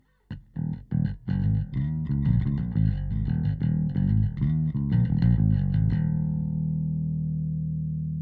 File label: Compressor bass Bass; Sam Pura Bass